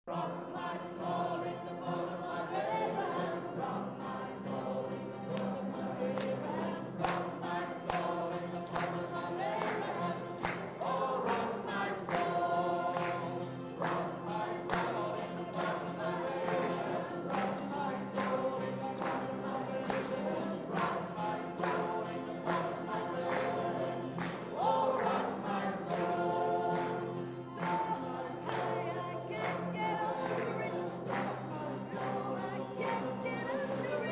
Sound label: Trio